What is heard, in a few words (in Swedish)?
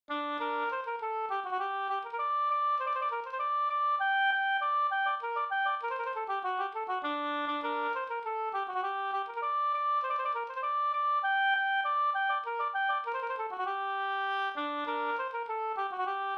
polska Bingsjopolska; e Petter i Ala e Pekkos Helmer